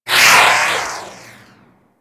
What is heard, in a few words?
Tractor Beam Rail Random H; Crp Space Bridge Transition